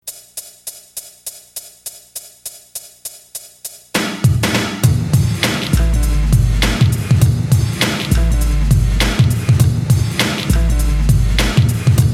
Beat Down

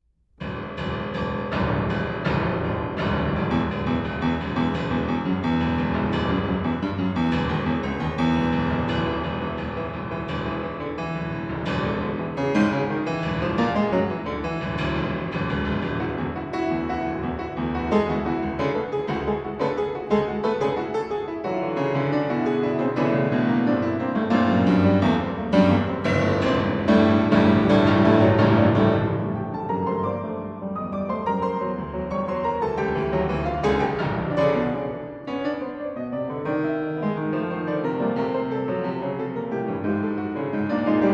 Piano Music, With Drums and Pipes

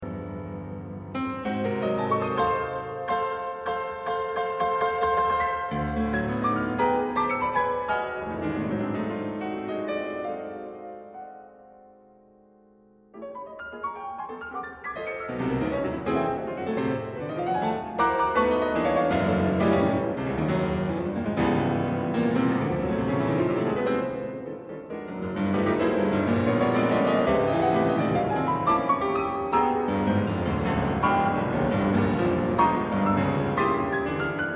Piano

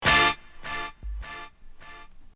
Horn

